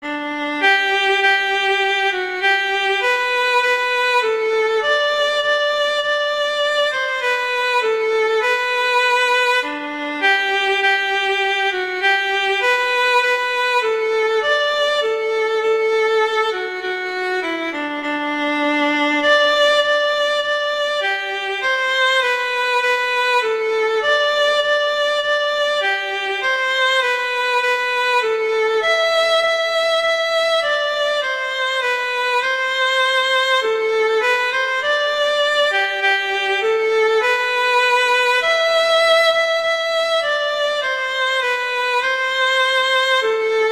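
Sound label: Hark The Herald Angels Sing, Violin; Christmas Beginners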